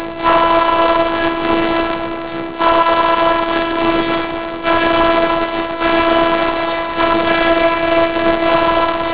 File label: handel chunks no phase